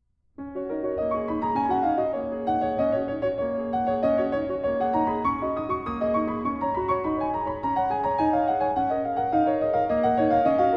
Partita For Solo Piano III. Allegramente

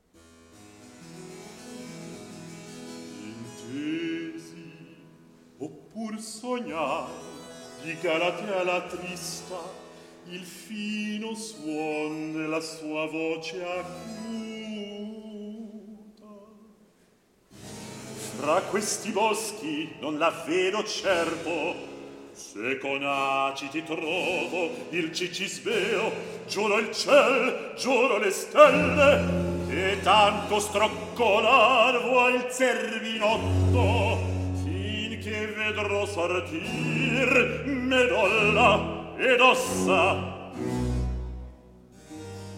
Recitativo; Intensi Oppur Sognai; Polifemo

